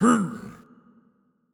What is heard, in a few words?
blues king hit; Crypt of the NecroDancer